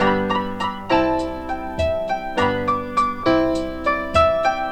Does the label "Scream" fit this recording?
No